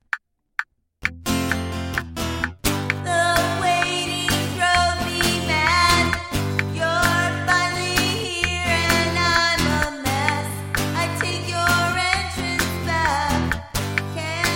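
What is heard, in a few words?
with vocals and reverb, has metronome noise; Cropped at Home in Audacity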